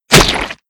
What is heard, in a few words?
Halloween Pumpkin Destroyed; fowl; Halloween Blocks